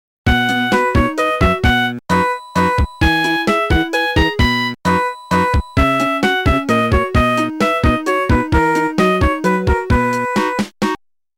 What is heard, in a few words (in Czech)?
melodie